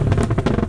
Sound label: pop loop, car